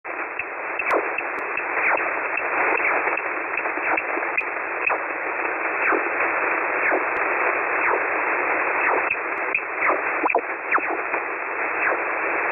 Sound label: 5260 kHz